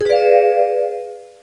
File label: surprise